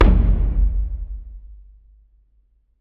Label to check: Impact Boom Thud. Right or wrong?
Right